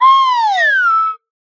slide whistle falling motif

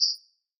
Bird Chirp; SoundsCrate

